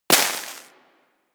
Bullet Impact Debris Snow

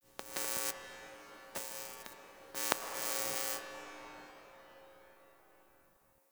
Fluorescent Light Flickering One Off; Warframe; Ambience; Entrati; Dante Unbound Leverian

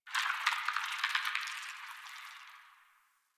Tree Critters Happy Calm; Warframe; Duviri

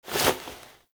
Foley Misc; Warframe; Operator

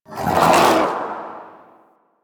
Destruct Cast; Warframe; Broken Frame

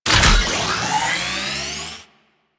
Crp Survivors Upload Start, Infested; Warframe; Infested Eris; Encounters

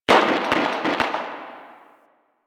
Distant Gunfire

